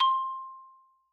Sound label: Xylophone Soft C5 ff far, Soft Mallets; Idiophones; Struck Idiophones